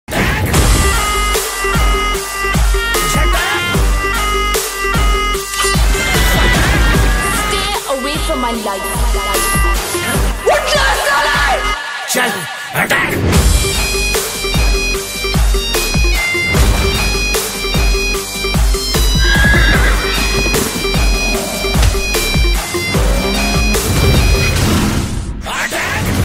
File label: attack song bgm; liger; thedeverakonda; purijagannadh